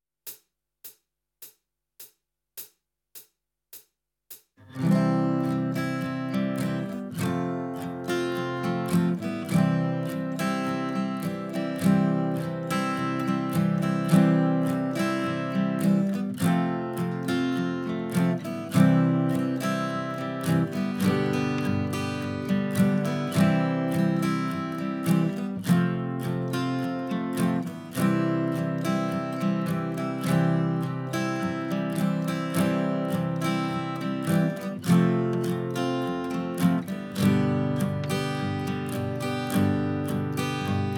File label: Backing track; Kenneth Baker - The complete keyboard player